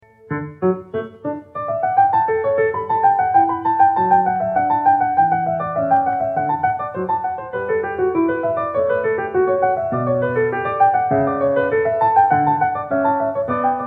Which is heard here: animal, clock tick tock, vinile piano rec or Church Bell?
vinile piano rec